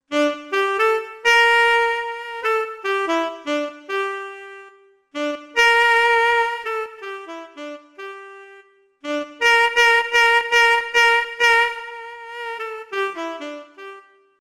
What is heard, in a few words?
Tenorsax; Artinoise Recorder App